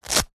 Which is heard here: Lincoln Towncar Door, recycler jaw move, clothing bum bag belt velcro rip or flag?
clothing bum bag belt velcro rip